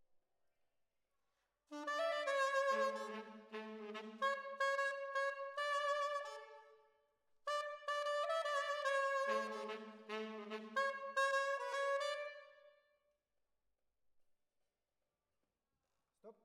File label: ORTF, Jazz for piano